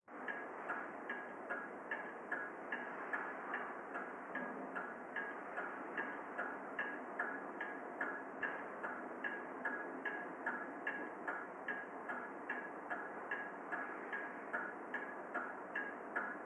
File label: Old Mantle Clock Tick